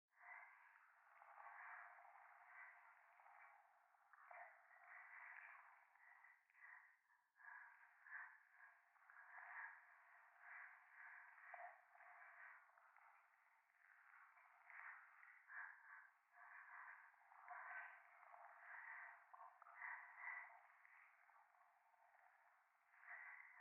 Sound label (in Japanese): 夢の中 右耳快感吐息 触手